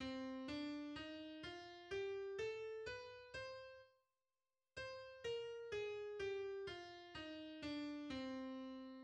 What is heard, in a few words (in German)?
Tonleiter c melodisch moll